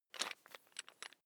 electric drill bit fasten wrench turn fast

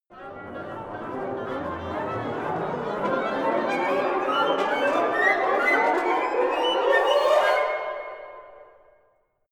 orchestra chaos

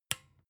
desk lamp on soft

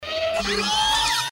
servo noise

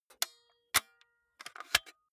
walkman open

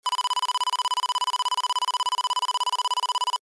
title running layer signal

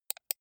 computer mouse right click soft

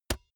basketball single hand catch hard

